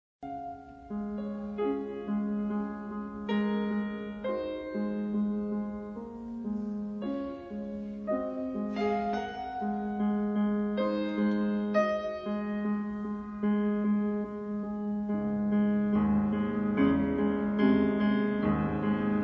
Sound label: piano